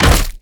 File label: rockfall